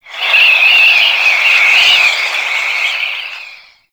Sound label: Mountain Pass Wind Gust Whispy; Ambience; Warframe; The War Within